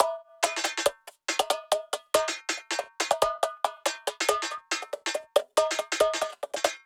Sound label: Loops 140 BPM Hihat Percussion Loops